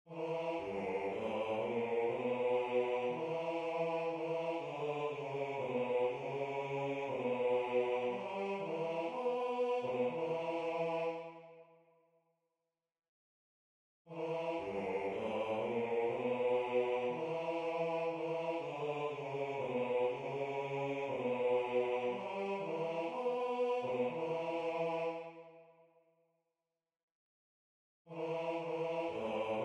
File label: Weicht Basse; Weicht, ihr Trauergeister; Buxtehude; Dietrich Buxtehude